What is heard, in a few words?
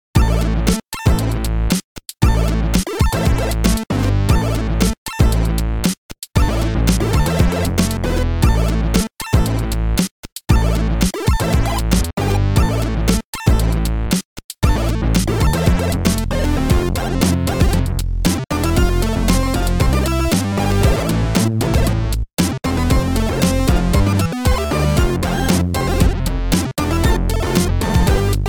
game sountrack; Explode Arena4; Explode Arena OST